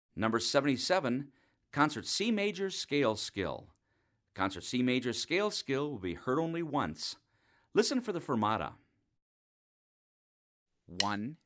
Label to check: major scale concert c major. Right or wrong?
Right